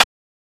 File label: Snare